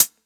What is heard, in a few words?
Closed Hat, 909; Slate; Vintage Drum Machines Volume 3 909 Edition; 909 Closed Hats